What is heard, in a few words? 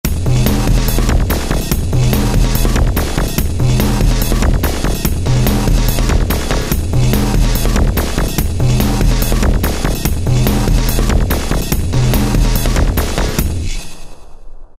Industrial, Factory Patterns; Korg ES-1